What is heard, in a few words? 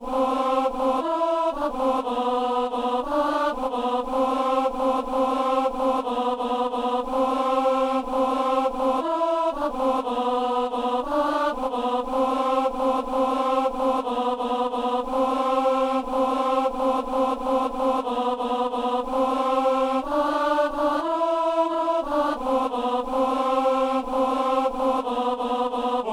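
tenor; Alleluia; Psaume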